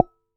Base Hit, Large Wine Glass

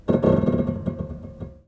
String Rub Erratic, Piano; Piano Miscellaneous

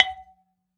Flower Pot Soft

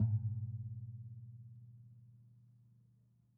Classical Guitar Marimba Chunks

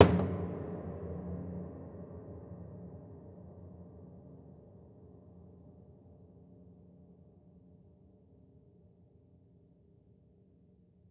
Body Thump Ped Sgl, Piano Destruction

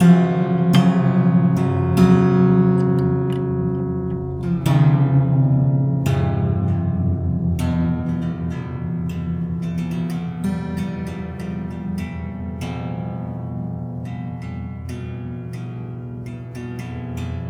Detune, Piano; Piano Destruction

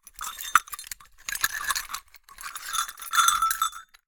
Cowbell Chain, Percussion

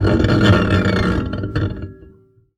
Classical Guitar Extended Technique

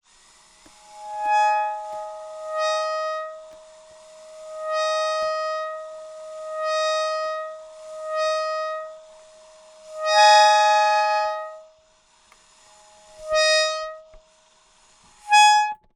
Clusters, Melodica

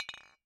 Bottle Struck

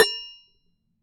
Damped Hit, Glass, Large Wine Glass

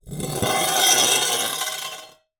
Drag, Vase

